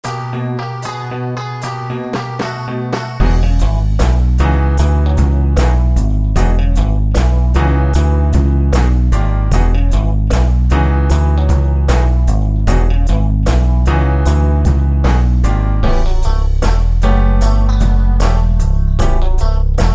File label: 12 Bar Blues boogie woogie bass line in E; Chord Progressions